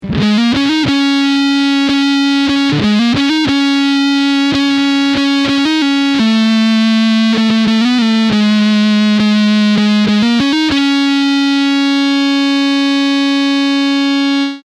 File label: Whisker Biscuit, guitar; Guitar - effect - Bulldog Cab Sim - sound card (software reverb on Clip 4)